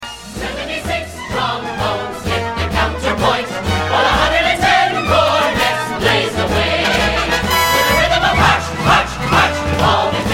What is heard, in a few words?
chorus; music man